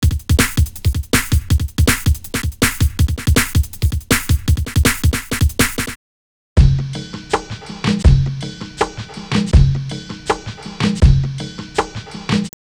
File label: breaks, Jungle; Zero-G Jungle Frenzy 1